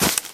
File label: punch